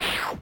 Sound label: whoosh up